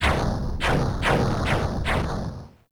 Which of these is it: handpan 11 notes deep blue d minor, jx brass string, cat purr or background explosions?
background explosions